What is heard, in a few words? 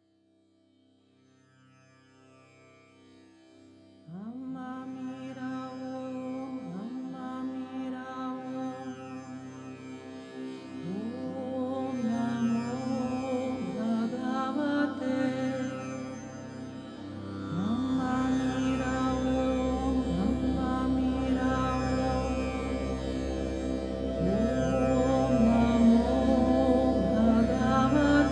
Om Choir